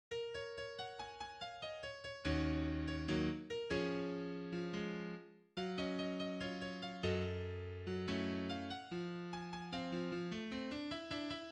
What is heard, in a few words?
MIDI, pop2piano; pop2piano ci